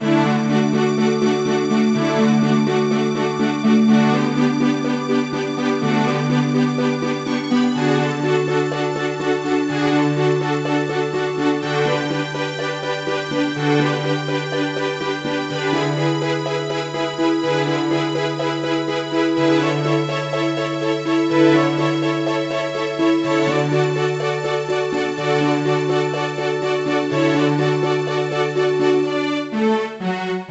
BGM Menu; Kirihara Shoten Kanshuu Daigakusei Ryoku Kentei DS; NDS

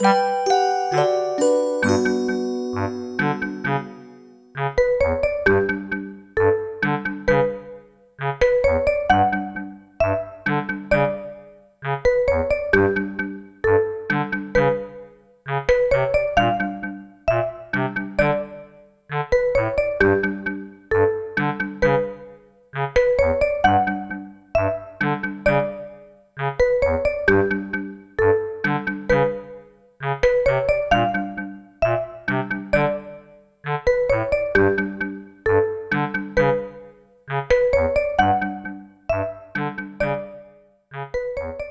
Low Score (Handbell Harmony)

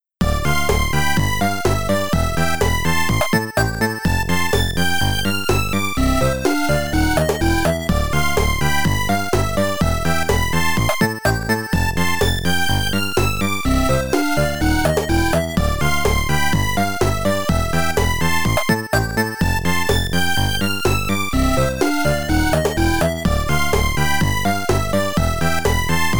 High Score Table, Amiga; Pang; Buster Bros

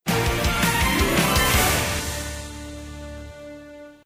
Level Up Stinger; Zak Storm Super Pirate; mobile

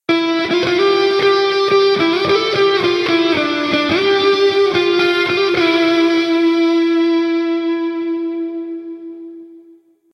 Win; Project Reality BF2 1.6

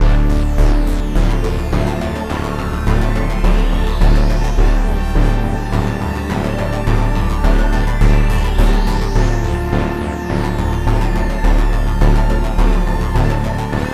angry moody fight loop; the warriors 2005 ps2

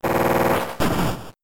Rollout

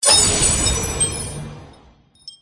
City Build

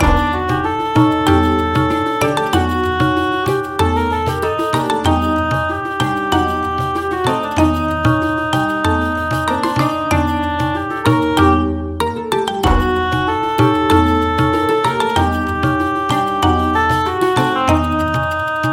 Music Short Orient; Akinator; Android

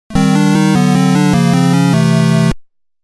wrong answer; numbers; sesame street; Rare Ltd; NES

